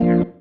Stab One Shots, Organ; Loops; Stabs; Sonic Foundry (Sony Creative Software); Joe Vitale Organ Donor

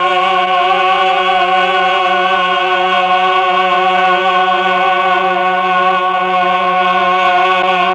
CHOR; ILIO; Propeller Island Legendary M400

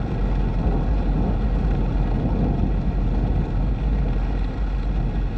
Ambience Loop, outdoor

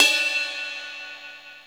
RIDE, CYMBALS

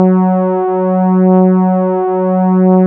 PAD PAD; SYN-PADs; Micro-Pads

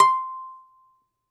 DOMRA C, Prima Domra, Plucked Strings; E-MU Producer Series Vol. 7 – Old World Instruments

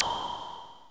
Korg Z1 Snare

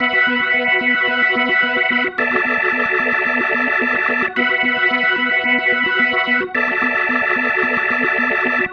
Psychedelic, Organ, Loops; Sonic Foundry (Sony Creative Software); Joe Vitale Organ Donor

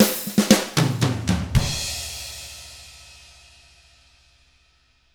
Drums, R N B 6-8; ILIO; Double Platinum Drums 1

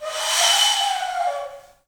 flutes, Heart of Africa; Spectrasonics; Heart of Africa 1

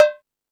Hip House, Drum Machines E-MU Pro-Cussion

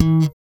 VALLEY FUNK, Bass; Spectrasonics; Bass Legends

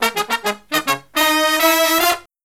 Killer Brass Riffs, 108 soul

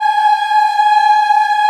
G#4 CHILD, Choir; CHILD IHP

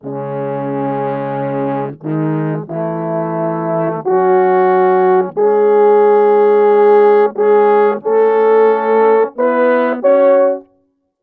90 c# min brasses, Orchestral arrangements

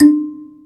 Bass Kalimba D#3; Planet Earth; Digital Sound Factory Planet Earth

